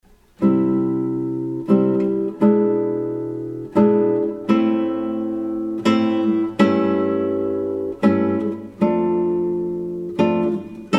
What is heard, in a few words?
Preludes Adagio, guitar